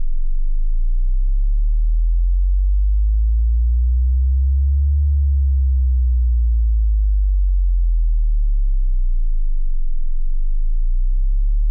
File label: 20hz to 80hz sweep loop